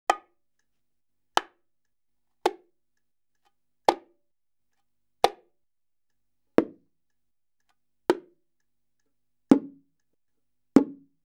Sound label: Salsa Picante; Masterbits; World Zone VOL-3